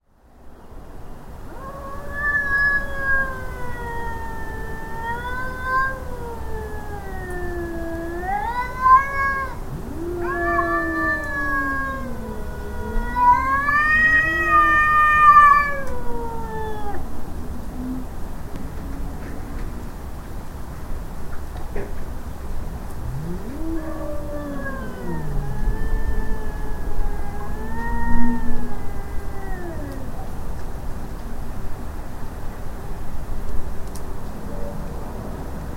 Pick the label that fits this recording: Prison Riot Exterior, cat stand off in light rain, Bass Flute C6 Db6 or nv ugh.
cat stand off in light rain